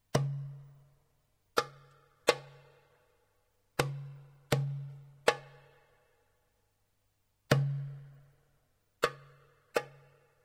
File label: darbuka